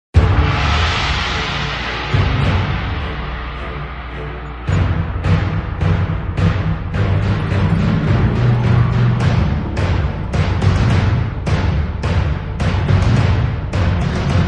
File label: Without Choir